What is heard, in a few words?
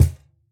Cajon hit f